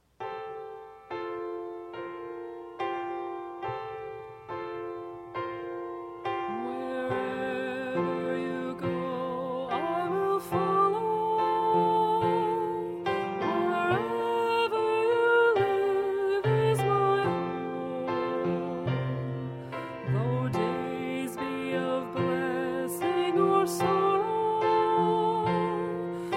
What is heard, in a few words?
Covenant Hymn